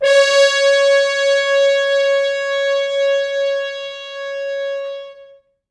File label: Horns stops